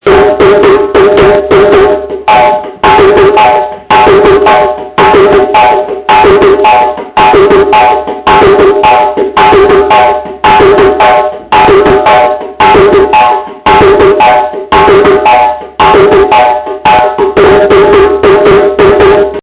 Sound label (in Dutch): Kadan Ritme